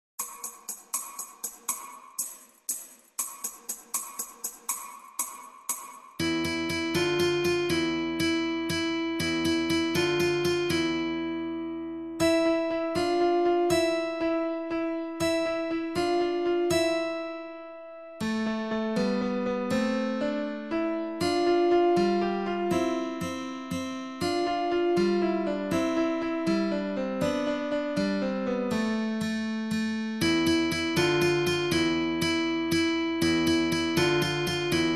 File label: Flamenco